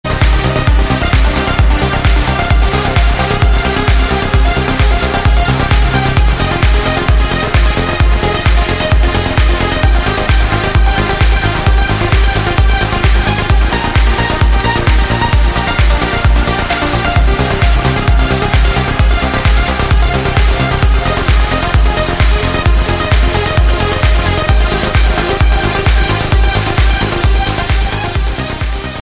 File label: fast music tone ringtone